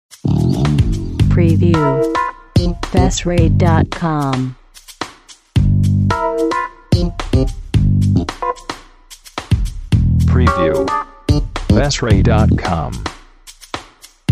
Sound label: Quirky Funk